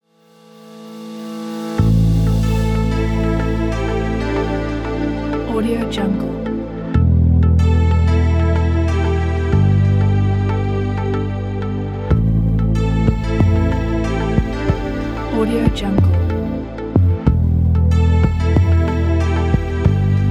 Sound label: relax documentary